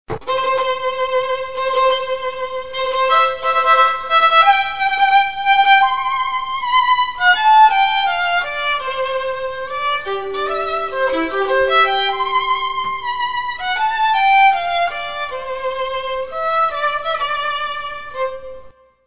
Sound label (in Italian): Marcia nuziale mendelssohn Violino; basi musicali professionali per matrimoni